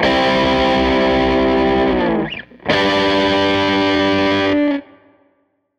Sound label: Les Guitar Loop